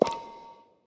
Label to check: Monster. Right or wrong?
Right